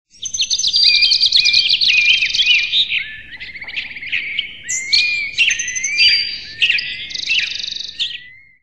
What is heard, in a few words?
Ring Birds; HTC Touch Diamond